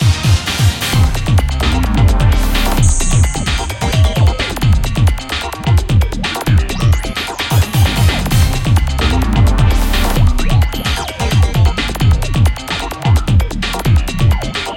Mission Clear

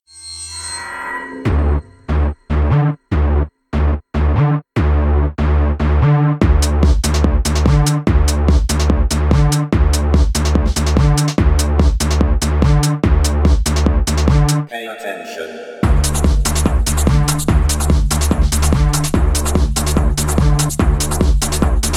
electronic; Avoid Sensory Overload

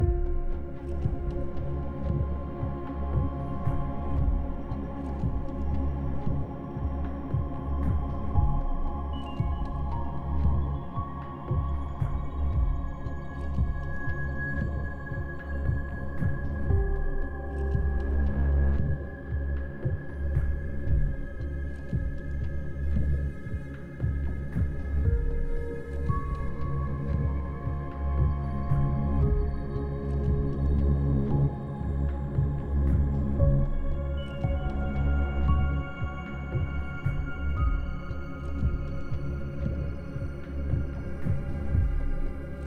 Ambience 57 5BPM

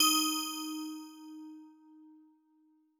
Pea Dance BGM Pattern

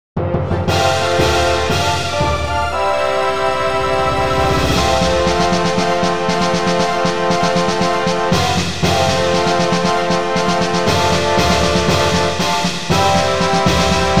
Battle Map , Victory; Langrisser Milennium

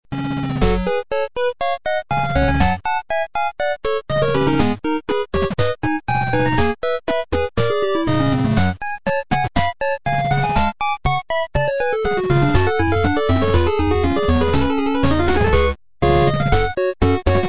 gameover; Nijikaku Mugen

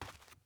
dirt step

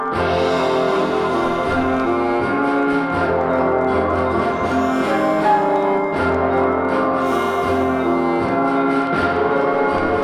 Newsreel Slowmo Loop 80bpm; jenny leclue detectiv

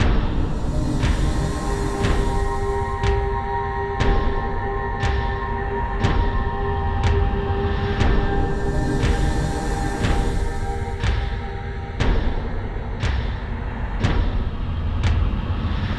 Success Tonal A5; Insanely Twisted Shadow Planet

